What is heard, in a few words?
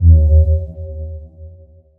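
Alert Low; FREE SFX PACK VOL 2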